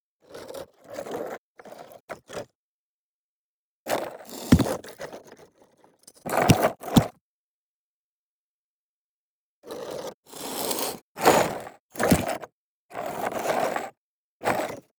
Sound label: golem bored; LoL; monsters